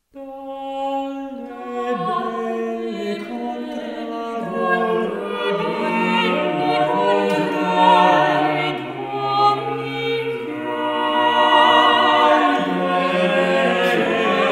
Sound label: madrigal; Cipriano de Rore; De la belle contrade d'oriente